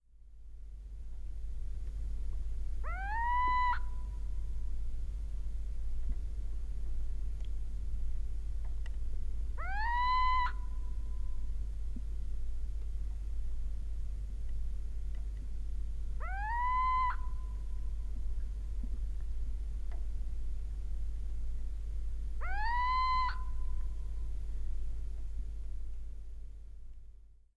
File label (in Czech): sýček výmena samec